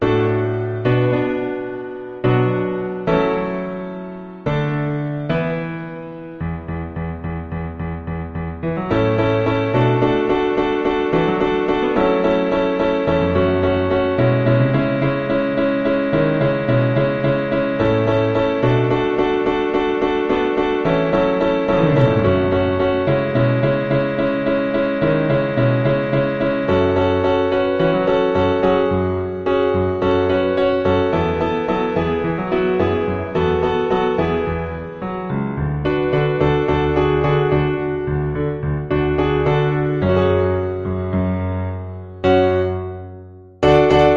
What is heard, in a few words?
accompaniment; mamma mia vocal audition materials